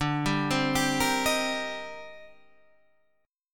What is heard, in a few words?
C Minor 7th D; Cm7/D arpeggiated; JGuitar